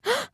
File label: gasp; peko